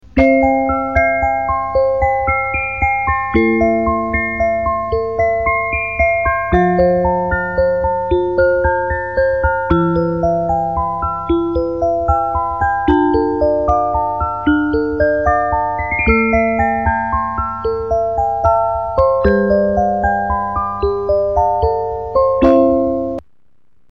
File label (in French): reuge, boite a musique